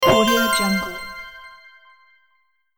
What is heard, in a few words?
magic poof bonus